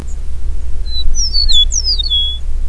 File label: E meadowlark song